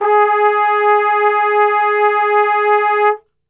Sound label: G#2, Brass; Best Service; Brass Super Section